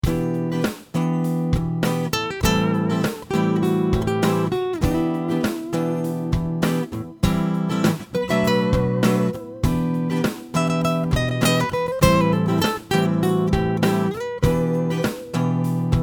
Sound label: Piezo test, guitar; GM-7 Piezo